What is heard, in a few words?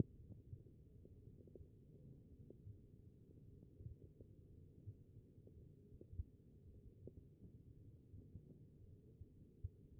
HEART; B MODE